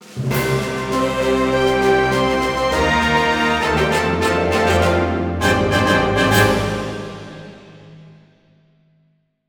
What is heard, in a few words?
Jingle Chapter; Inazuma Eleven Strikers; Wii